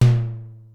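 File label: Simmons SDS-9 high tom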